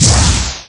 Hit Magic; A player getting hit by Zelda's magic (weakest to strongest)

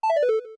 Notebook Exit; Exit the Notebook

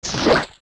Enemy Hit

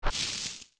steps tall grass; OOT

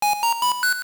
Dungeon Signal; The dungeon compass secret-signal; Zelda Oracle

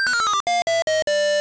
Game Over; zelda sounds

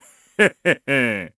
Laugh; Gondo; zelda sounds; Skyward Sword

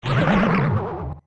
Hit; Jabu Jabu; zelda; OOT

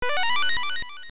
Mystery Seed; Use a Mystery Seed (also a block appearing from the Cane of Somaria, and more)

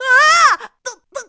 Scared; Link scared; zelda sounds

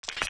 Steps Ice Land; zelda; OOT

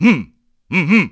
Mm Hmm; Zelda

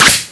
Bow Shoot; Shooting an arrow; Link

